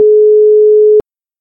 ringback; phone; Palm; webos-applications

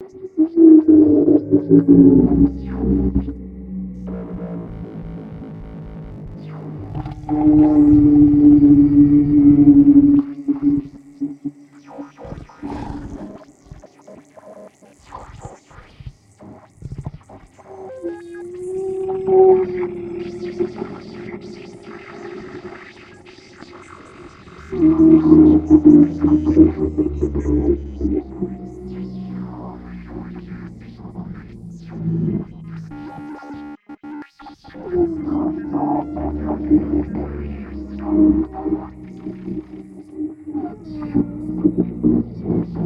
Guardian Mech Loop; Journey

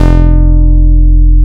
Harmonics Strong Seventh; Ableton Live 12 Lite; Vector; Sprites

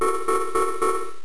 Fast Gauge; MMBNMMOP

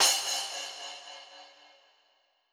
Crash, Cymbal, Drums; One Shots; Tremlow; Ableton Live 12 Lite; Core Library

